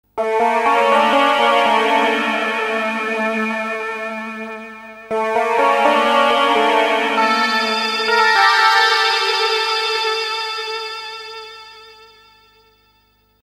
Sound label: twangy